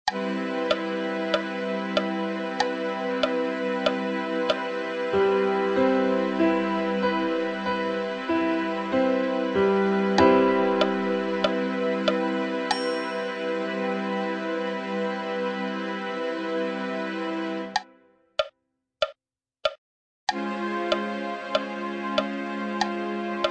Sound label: maj7 inv